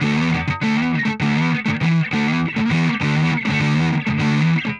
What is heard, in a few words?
Pushing Riff, Rock Guitars